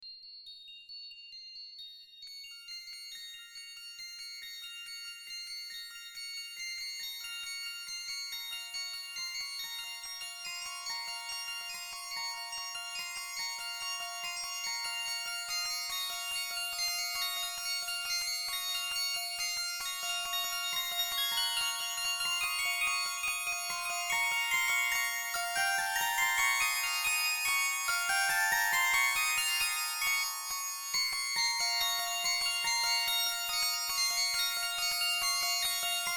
Carol Bells

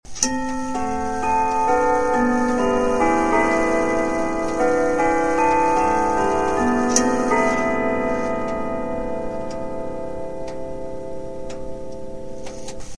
Wittington half hour

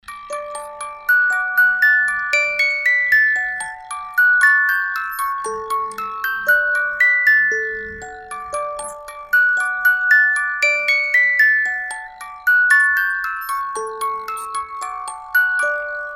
musicbox

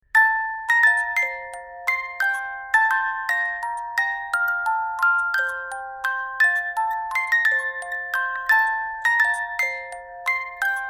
Piano Sonata In A Major, music box

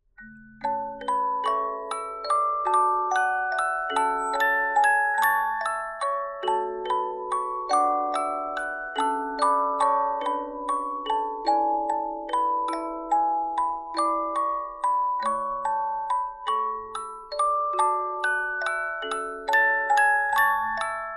Bach Choral De Cantata, musicbox

